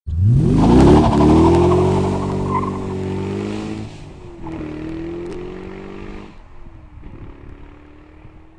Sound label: start